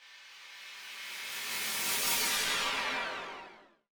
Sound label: warp; LcdDem SFX